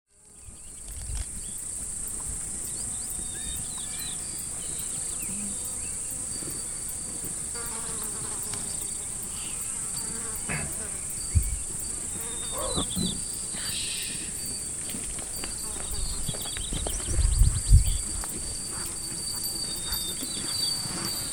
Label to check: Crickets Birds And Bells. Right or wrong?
Right